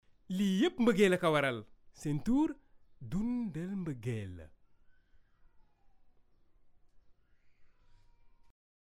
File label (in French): rythme